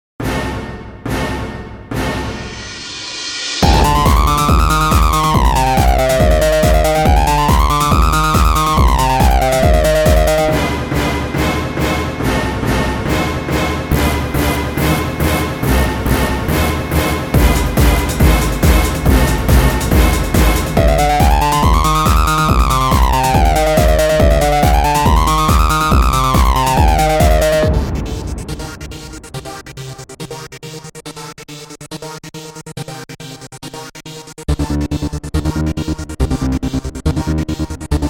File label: instrumental